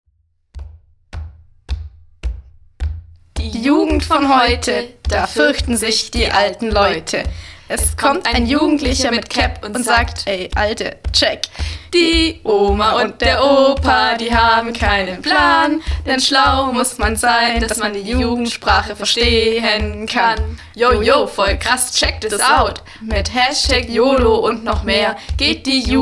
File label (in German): jugendsprache song